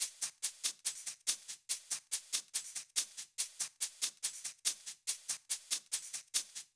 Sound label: Percussion Loop 142 BPM; Air Head; Percussion Loops